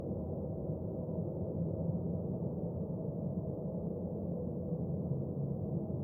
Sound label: Chamber Wind; hidden deep windows gamerip 2022